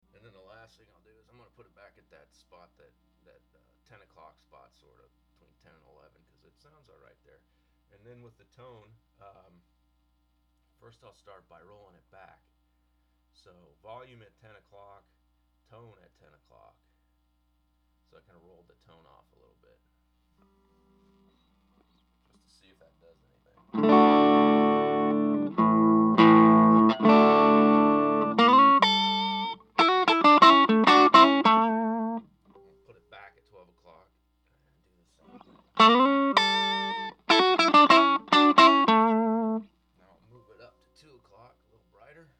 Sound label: vox 4w tone control; vox ac4tv